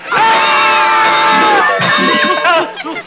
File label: hurraaaa